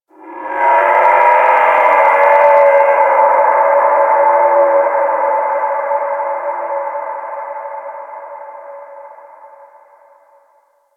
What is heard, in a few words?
Ice Creature Distant Cry; Ambience; Warframe; Corpus Ice Planet